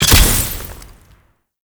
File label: Invisibility Proj Activate; Warframe; The New War; Drifter; Abilities